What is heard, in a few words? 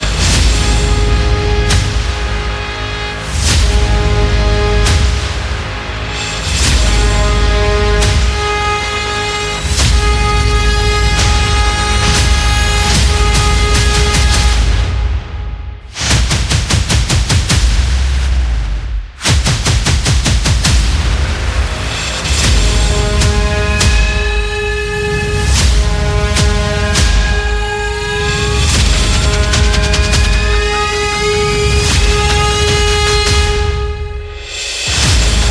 Alarm Trailer; intense trailer themes